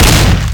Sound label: shotgun mossberg590, Gunshots; GMTKGameJam2020